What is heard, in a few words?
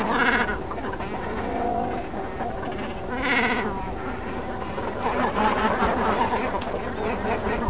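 Cormorant, birdcall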